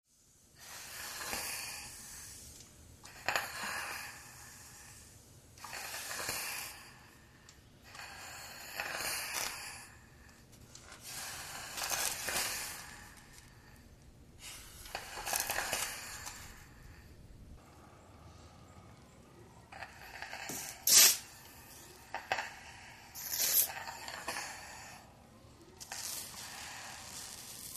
Steam Iron Blasts

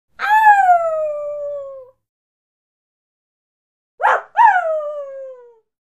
Dog Howls 2 Dog Howls; animals dog

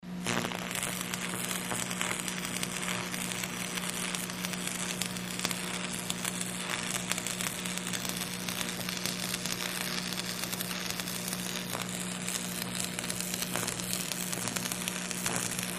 Welding, electric arc, weld with crackle

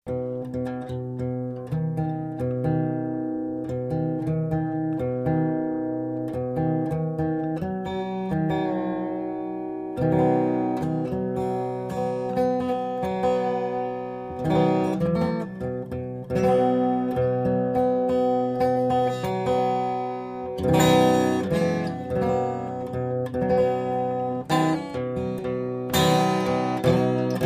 Guitar Tuning Single

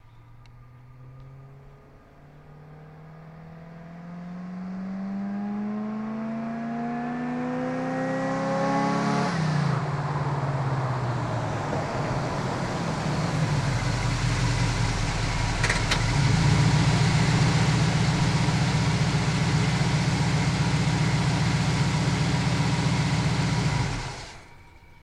Blazer Fst Idl Off, chevy blazer

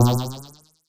synth boing

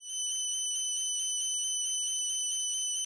Computer Alarm Beep

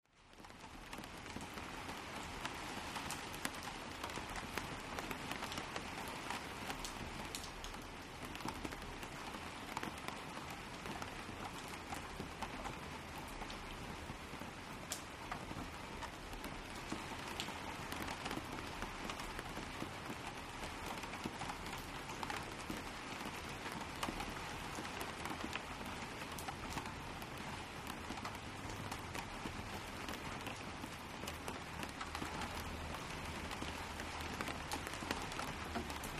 Rain Medium On Outdo

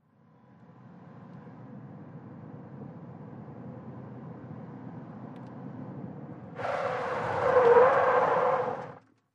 Sm Car 180 Skid, compact

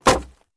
Bullet Hits Wood Soft

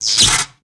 Elect Arc Scratchy, arcs & sparks

